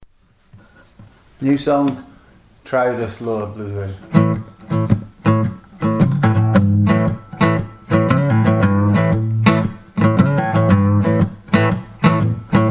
owed to joy slower blues version

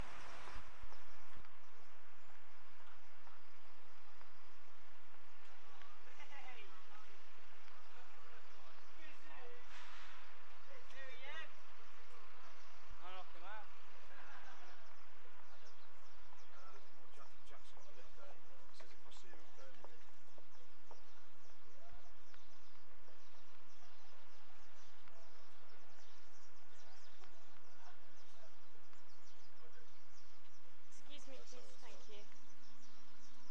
bus station birds, recorded at dusk; worcester

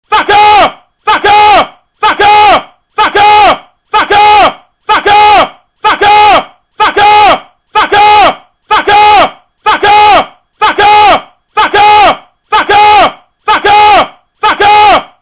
looped fifteen seconds of me yelling fuck off

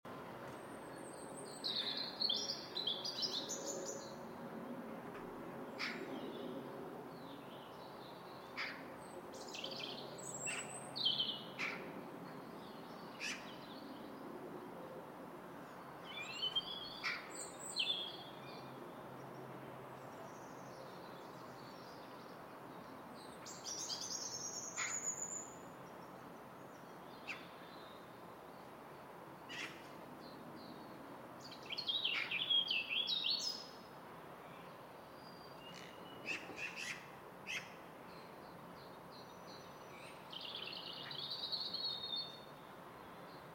friday afternoon birds

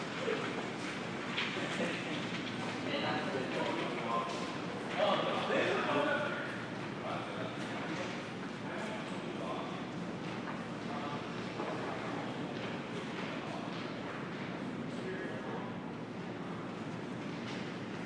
tent ambience

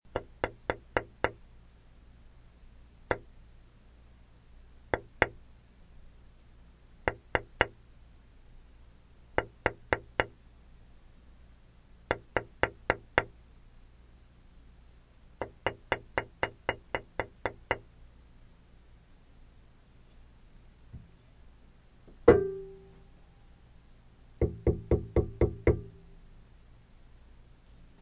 knocking on ceramic plate

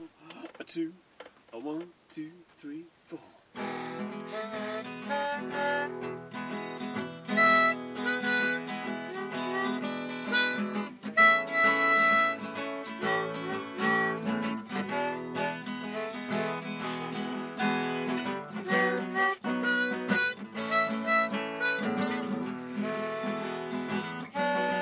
blues on guitar, pitch pipe

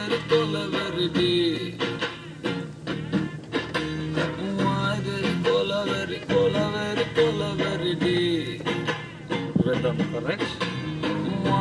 soup song

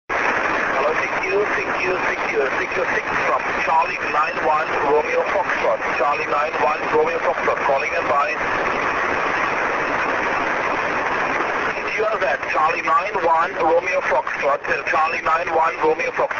6m ssb

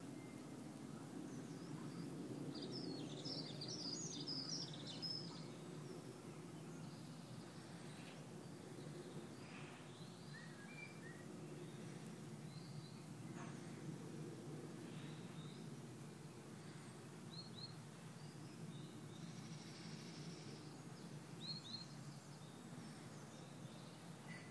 dawn chorus blackbird

